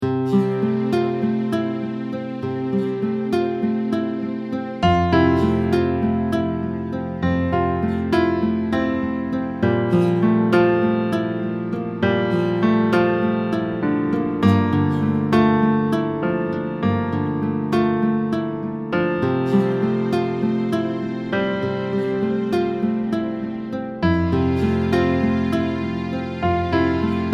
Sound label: bad jazz